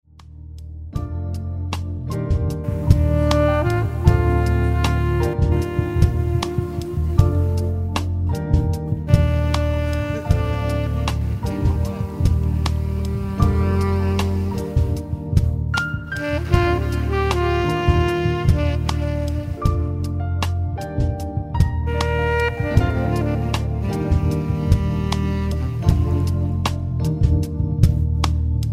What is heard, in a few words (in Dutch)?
Lounge muziek LIVE